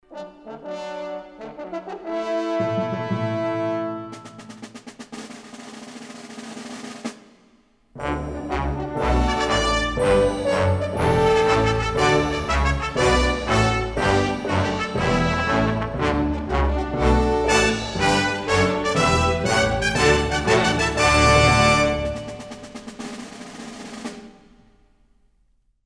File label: fanfare